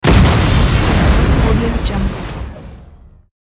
explosion

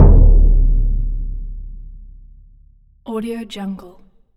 bass drum hit

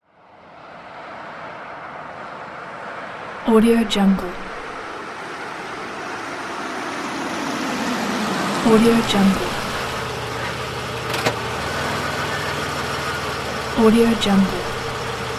the car pulls up on the wet road; palmirasoundstudio

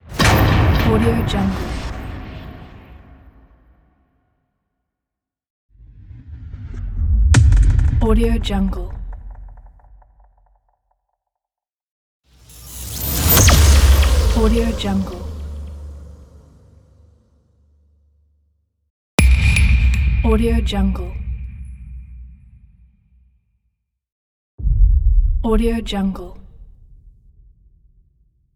loud trailer fx pack

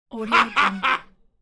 mad laughing

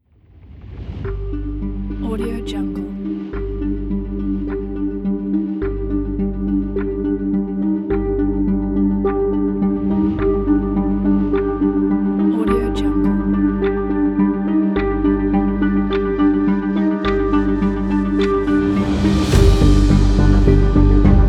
tension suspense trailer

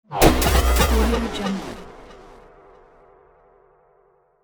phase transition scifi

